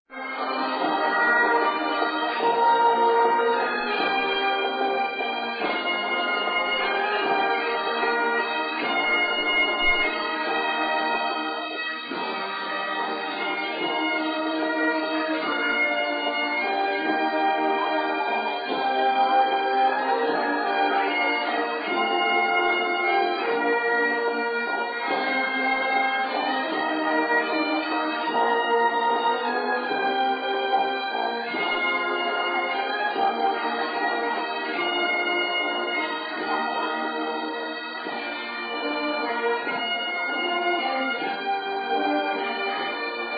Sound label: bagpipes